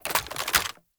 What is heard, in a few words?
AK47 Reload Raise; Warframe; Tenno